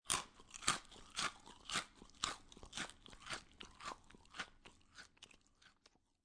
Human, Chewing Pretzel, Eating Pretzel, Biting Pretzel; Eating Sound Effects